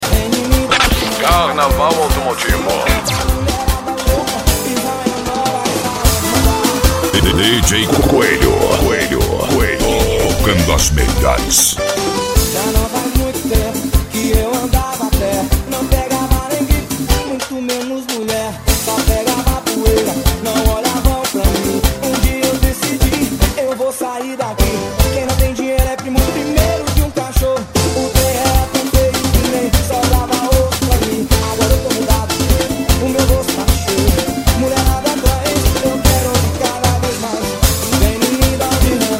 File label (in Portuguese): carnaval automotivo